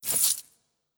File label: Upgrade